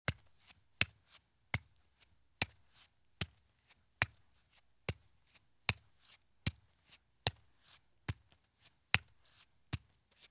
Bare Feet Take Slow Steps on Concrete Stairs; Sound Ideas; Footstep Sound Effects

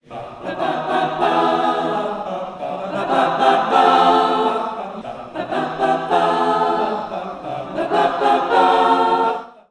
church choral; pray church; best service Blue Box

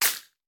Bullet Ricochet; Sound Ideas; Guns

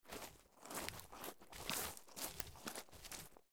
Coast Steps

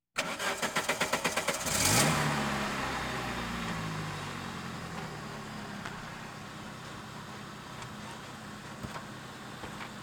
Automobiles various

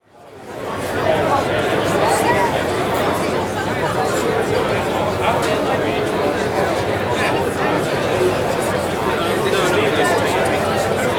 Crowd Outdoor Busy Event Reception, Walla; Sound Ideas; Walla Series

